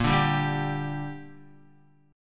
Bb 6th, Guitar; Bb6 strummed

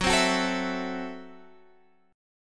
F sharp Minor 7th Flat 5th

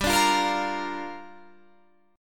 A sharp 6th, chord

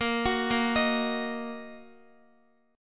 Bb Suspended 4th Sharp 5th Bb down Guitar; arpeggiated; tuning 4E,4A,5D,5G,5C,6F

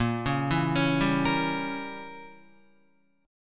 Bb Diminished 7th Bb Guitar; Bbdim7 arpeggiated; JGuitar

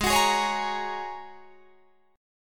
Ab 9th Suspended 4th Ab down Guitar Drop D

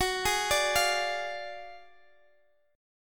D Diminished F sharp; Ddim/F#

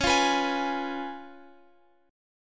C sharp 7th C sharp, Guitar Open F; C#7; strummed; tuning=Open F; guitar chord

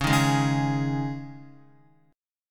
Db Suspended 2nd Guitar 4F,4A,5C,5G,5C,6E; strummed; JGuitar

